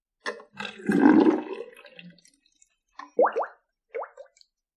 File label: sink draining